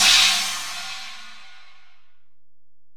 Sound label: Ride, Alesis DM-PRO, Drum Machines